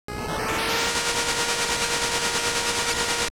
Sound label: dash charge; 8-bit Platformer SFX